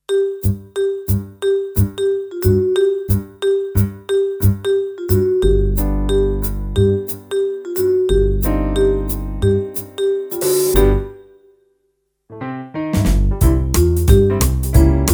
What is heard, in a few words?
Instrumental